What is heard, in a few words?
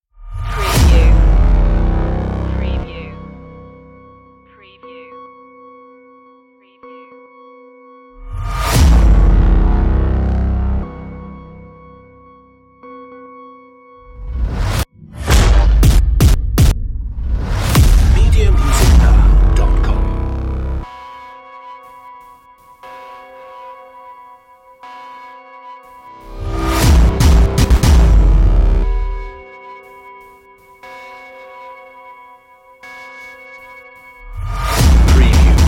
alpha predator less synths